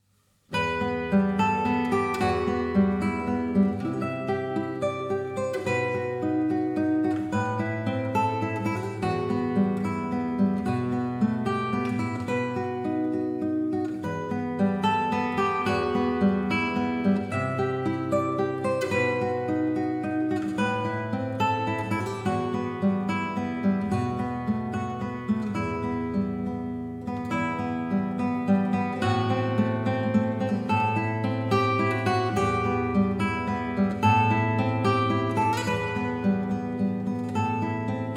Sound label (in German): Gitarre; Oma Theme